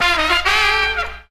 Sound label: horn stir